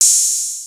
GRUVE DRUMS; Rubber Chicken Software Sample Bank

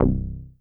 POKE BASS

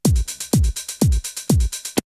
Drumloop; ZERO G - Datafile DISC 2

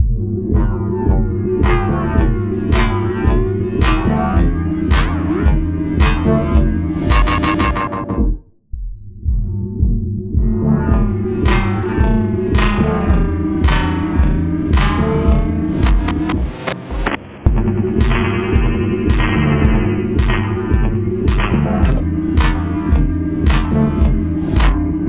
filter beat; Polyend

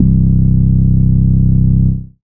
Acid Bass, Dance Synths

